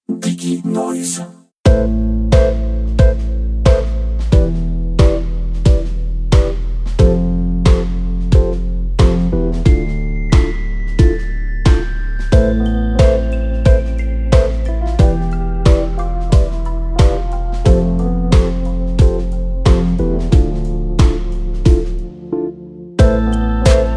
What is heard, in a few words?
fat key vibez; diginoiz